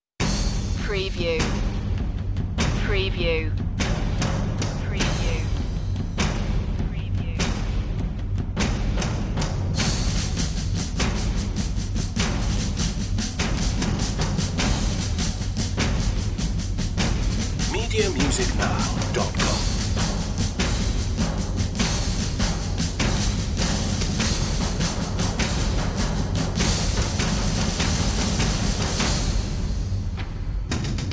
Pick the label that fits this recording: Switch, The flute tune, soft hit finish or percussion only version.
percussion only version